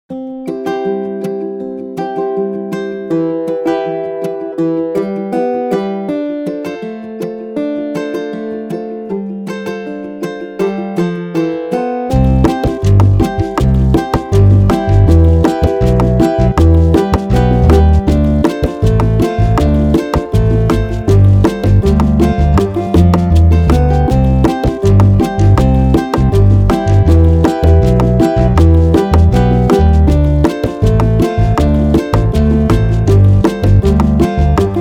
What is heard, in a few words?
Latin, Classic Guitar; stereo acoustic nylon guitar; Latin demo; made on a beta of ClassicGuitar, without any articulation; Pettinhouse